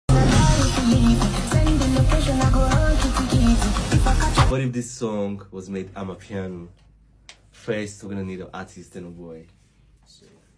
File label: Amapiano Version